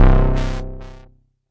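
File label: mbrute synth, Arturia MiniBrute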